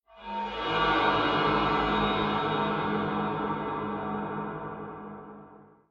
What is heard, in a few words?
Nightmare Soundscape